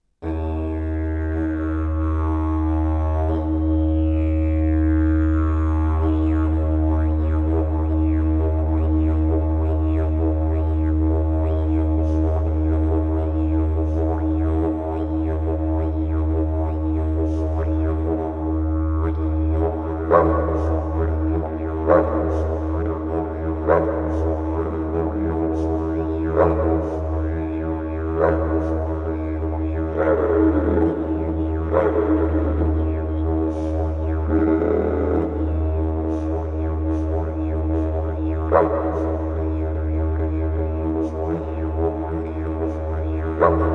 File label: didgeridoo